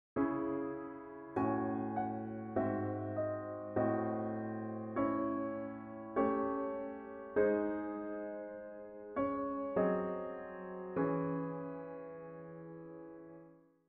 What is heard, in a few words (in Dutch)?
gealtereerd, gealtereerde subdominant